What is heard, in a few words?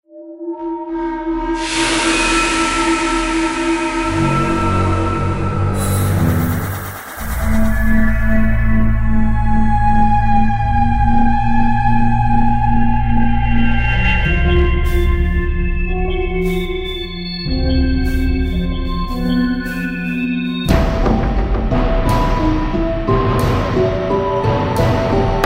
mystery and suspense; halloween